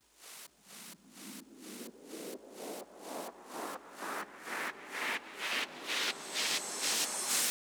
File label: FX Uplifter SD; Multi Drum Loop 05